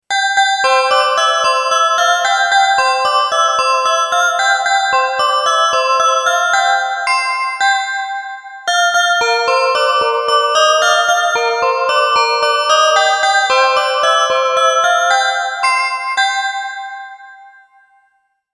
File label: crystal tones ringtone